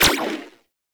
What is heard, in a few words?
Shot 2D Fire, Tommy Gun; Warframe; Tenno; Stradavar Prime